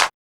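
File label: clap; E-Lab; X Static Goldmine 1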